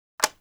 sniper bolt up, weapons

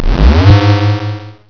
pickup; tfc

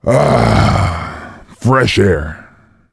cheer; svencoop; voice commands; SeriousSam

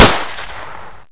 sterling Fire; firearms

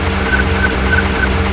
sentry idle

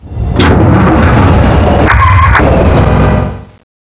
tank on

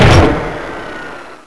p shoot; panther

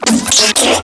drone flinch; gearbox

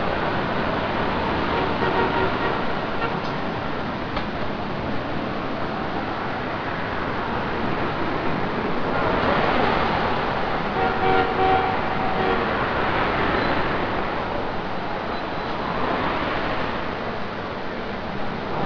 bronx ambient; cstrike

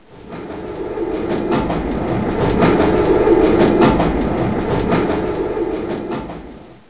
train

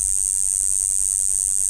bugs; svencoop; jungle

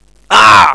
pain; svencoop; paranoia; diversant

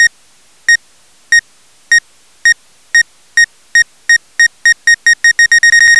beep

